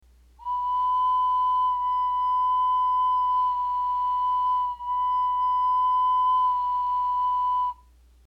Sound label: breath